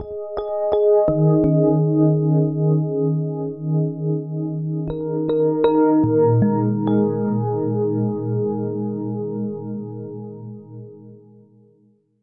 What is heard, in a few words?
FM Chords, Keys; Sonic Foundry (Sony Creative Software); Slow Silhouette